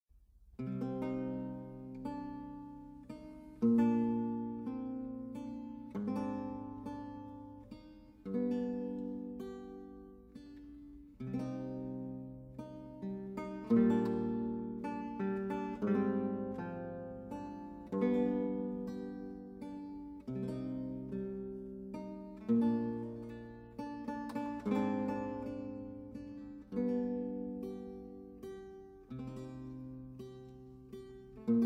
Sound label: Interlude Passagaglia improvisation; Banchieri